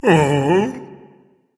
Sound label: sigh, berserker; cuchulainn